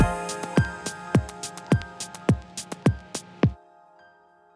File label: Crunchy Drums; BB 2HTC Samples Vol 4